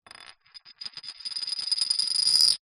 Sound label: plastic disc small set down table spin; foley; zapsplat